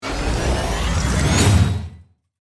Mechanical Engine, Accelerate, Power, Up, Transforming, Robot, Production Element, Imaging Element, Accent, Transition; Spacecraft Sound Effects; Blastwave